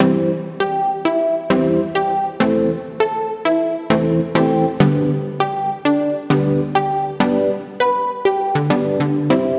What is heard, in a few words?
Loop 100bpm C Prog Vacation; Leads; Ghosthack